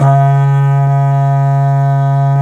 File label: C#2 horn, didgeridoo; Big Fish Audio; DIDGEHORN1